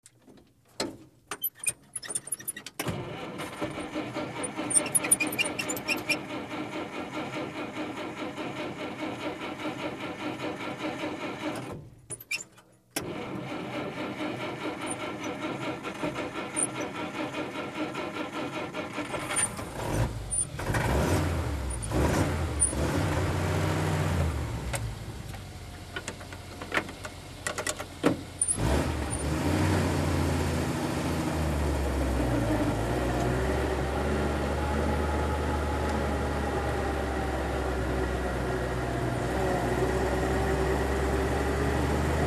Dodge Power Wagon Fire Truck, Interior Pov, False Start, Start with Pedal Squeaks, Shift Into Gear, Drive with Gear Shifts, Stop, Off.